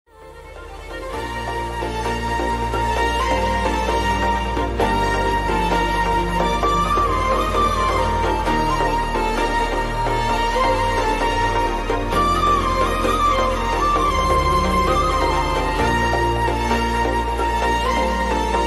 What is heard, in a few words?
song music ringtone telugu